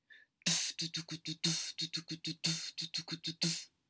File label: bad march